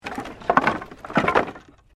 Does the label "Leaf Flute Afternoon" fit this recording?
No